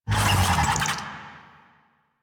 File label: Infested Big Ashen Vocal Pain; Warframe